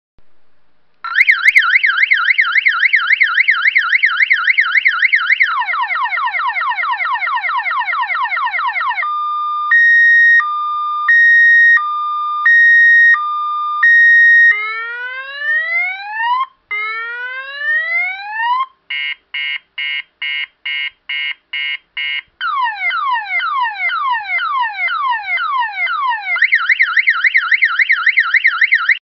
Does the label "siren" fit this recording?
Yes